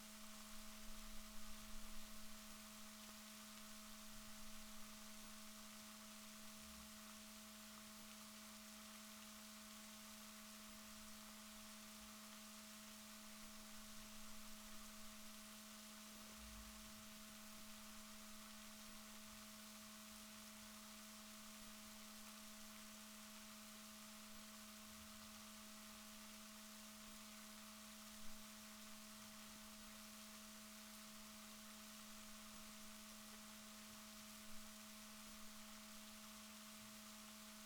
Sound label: rorquals positif, Balaenoptera Physalus Chile